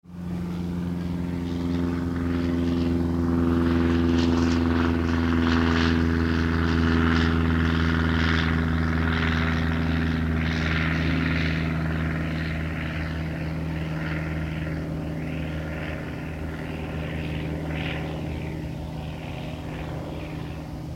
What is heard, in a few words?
airplane propeller passing by